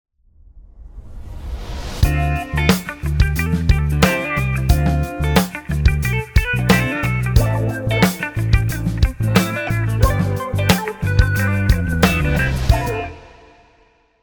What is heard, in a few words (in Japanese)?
ジングル